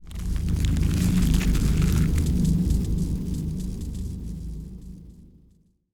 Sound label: astral scar planet; Stellaris; machineage; planets